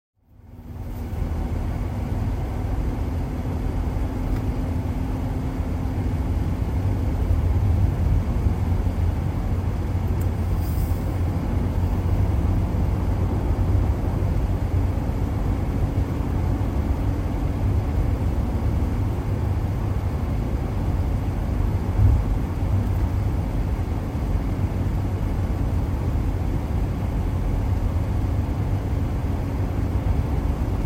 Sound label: white noise car ride sound with air conditioning for meditation studying and relaxtion